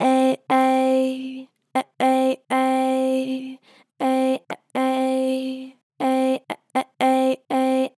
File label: "Eh Eh", Harmor Vocals, Pitch Flat Strong